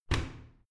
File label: washing machine modern close